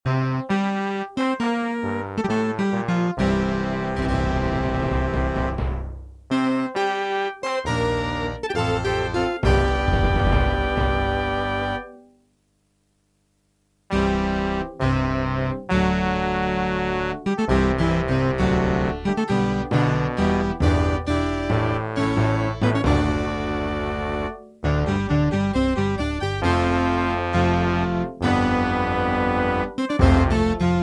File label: on 80s yamaha electone with percussion